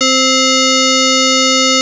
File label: synth, SQ80